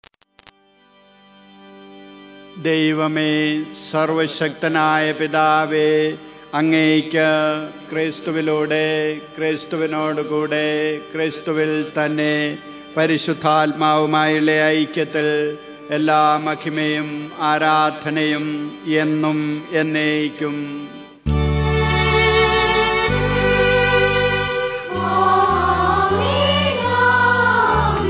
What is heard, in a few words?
Amen amen; METHRABHISHEKA THIRUKARMMAM